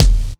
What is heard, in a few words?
acoustic kick drum sound f key, Royality free bass drum tuned to the F note. Loudest frequency: 1104Hz